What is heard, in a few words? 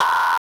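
ALARM BP, alarm beep; Total Control - Action Cutz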